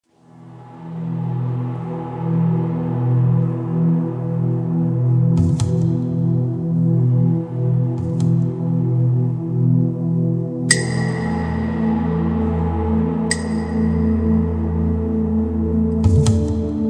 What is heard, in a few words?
ambient with nature; wyciszenie